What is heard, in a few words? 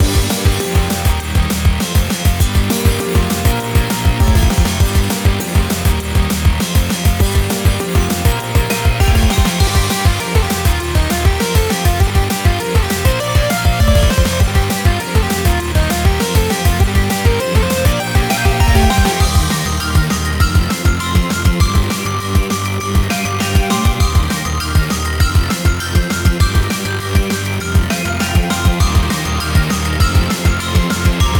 Scuffle Shuffle [Miniboss Theme]; Tribal Hunter